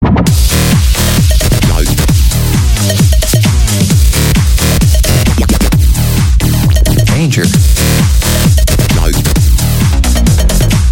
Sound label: electronic danger